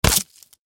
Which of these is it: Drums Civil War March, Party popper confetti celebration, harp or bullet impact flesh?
bullet impact flesh